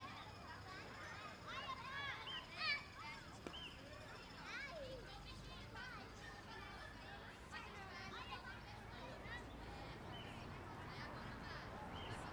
Ambiencia praca do bambu mais longe dia das criancas parquinho, vozerio; ambiencia urbana

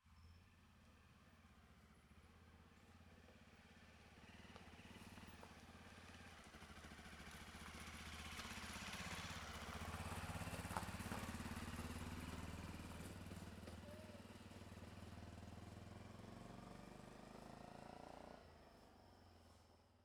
Moto passando bem devagar em rua com grilos

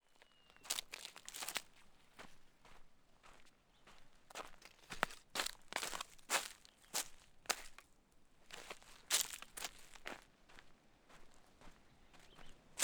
Passos na grama seca; Colecao Sonora do Cerrado

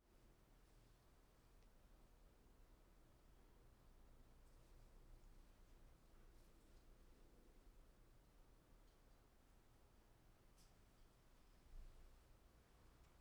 Ambiencia Sala de Estar Apartamento de Dia; ambiencia domestica

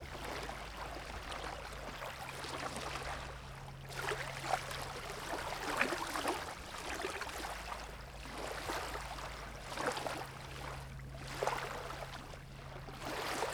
Pequenas ondas orla do lago paranoa parque das garcas em fim de tarde com; passaros l; Cerrado

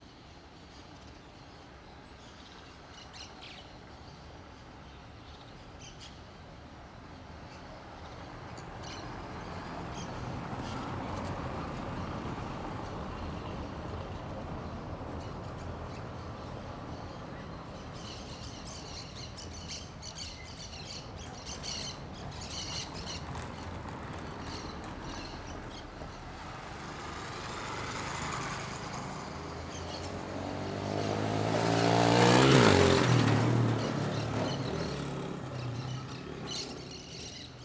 Transito rua a tarde passaros carrinho moto e carro com musica passando

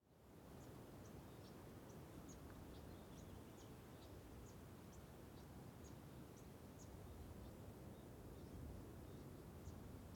Vento Forte em Trilha de Capim Alto Proximo a Cachoeira; Cachoeira do Sertao Zen

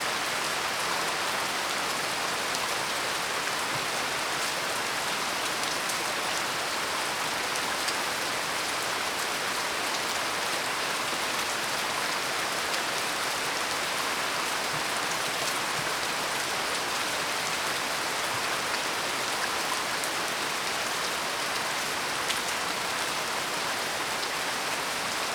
Chuva forte caindo em calha do telhado; agua

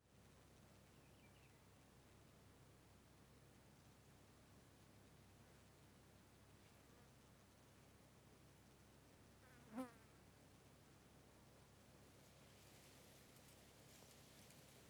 Estrada rural meio dia com vento suave; ambiencia rural

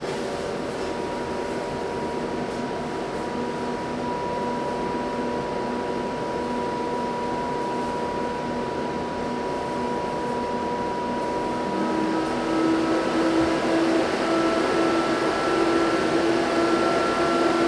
Maquina de resonancia; equipamentos

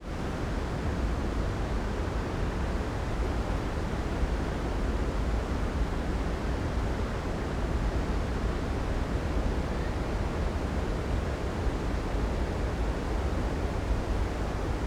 Rio mais a frente da Cachoeira Salto 120 metros do Parque Nacional da Chap; agua

